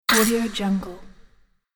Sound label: metallic droid hit